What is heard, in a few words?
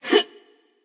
grunt jump passion; chaac